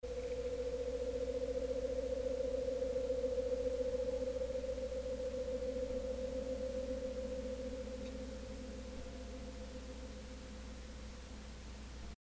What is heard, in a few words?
clean harmonic; Aeolian; aeolian night